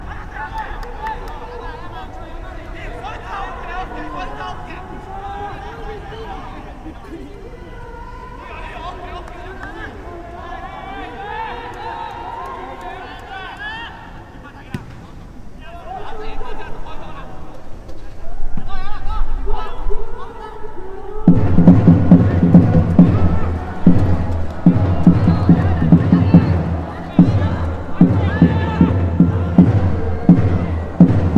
soccer spectator

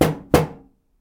knock on microwave; knocking

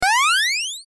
jump

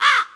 crow

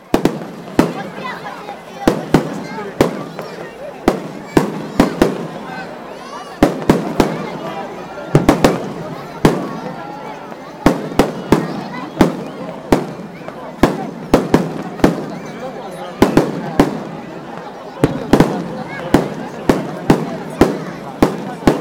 fireworks fest

